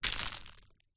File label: stones small debris fall down pile; foley